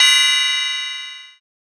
cymbal dark modal, memphis dark

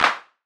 clap soft, lofi dust

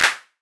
clap brush, amapiano; amapiano log drums

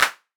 clap tight; drill toolkit

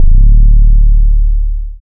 808 slide, trap

